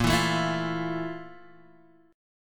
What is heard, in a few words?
F Major 7th Bb, chord; Fmaj7/Bb strummed; JGuitar